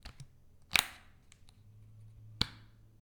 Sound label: Plastic Bottle Lid Closing And Opening